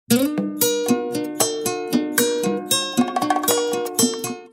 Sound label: instrumental